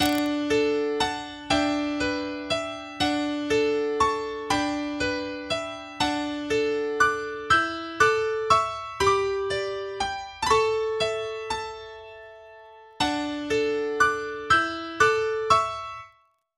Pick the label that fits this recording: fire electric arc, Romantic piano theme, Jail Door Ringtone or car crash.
Romantic piano theme